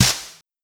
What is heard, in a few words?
Snare, Fricke MFB 502; Drums